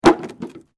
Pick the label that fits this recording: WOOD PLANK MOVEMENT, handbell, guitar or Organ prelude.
WOOD PLANK MOVEMENT